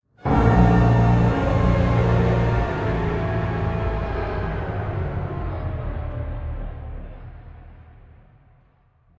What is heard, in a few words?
music Game Over